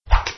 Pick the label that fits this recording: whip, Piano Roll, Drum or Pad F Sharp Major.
whip